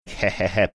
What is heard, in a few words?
heh heh heh